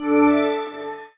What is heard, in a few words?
information note; Nokia; 770 Internet Tablet